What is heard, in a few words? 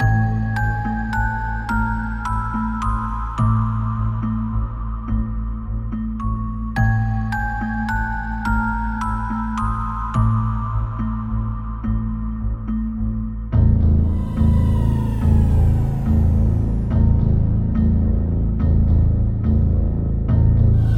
Dungeon